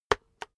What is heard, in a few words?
keyboard external enter hit slow